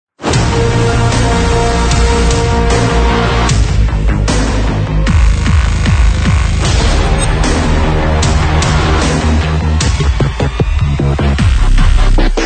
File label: Epic Hybrid Trailer Dubstep Build